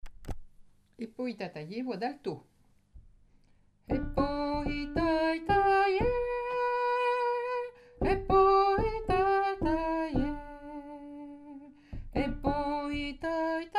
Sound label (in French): alto, choeur